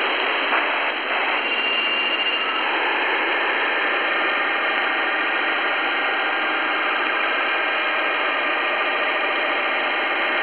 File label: websdr recording